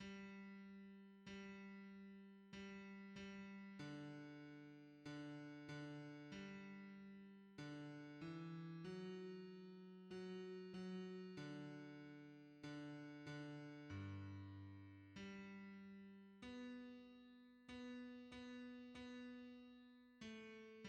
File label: bass; hymns; How good is the God we adore